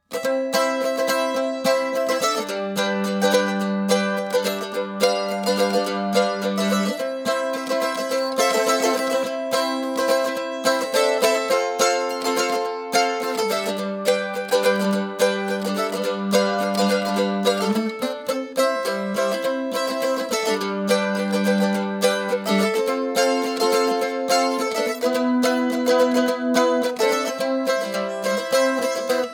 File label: mandolin